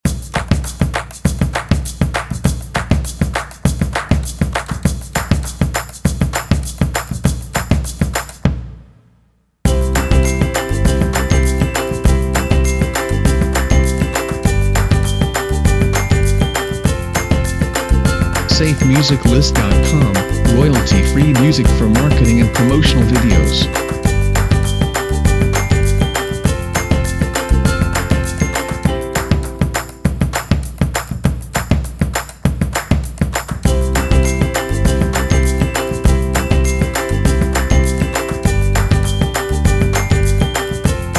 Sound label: Stay Positive